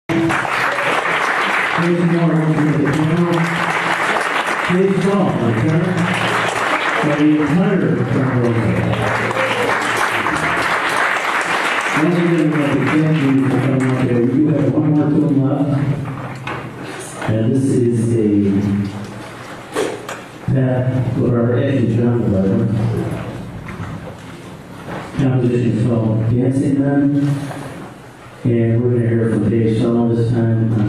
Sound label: Solo On Soprano, Jazz Ensemble; ubjazzensemble